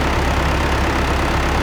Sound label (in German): einsp, diesel; akustik